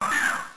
throw, Battle; P3D-Legacy; 2.5DHero

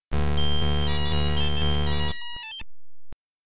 rocket broadcast; P3D-Legacy; 2.5DHero

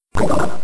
poison, Battle Effects; P3D-Legacy

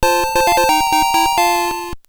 Receive Item; P3D-Legacy

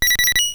shiny; P3D-Legacy

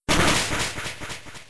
super effective, Battle Damage; P3D-Legacy

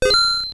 buy; P3D-Legacy

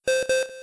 pc logon; P3D-Legacy

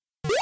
jump ledge

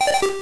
pokegear off

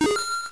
buy; P3D-Legacy; 2.5DHero

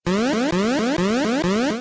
alarm; P3D-Legacy; 2.5DHero

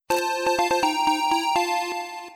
item found; P3D-Legacy

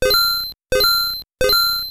item finder; P3D-Legacy

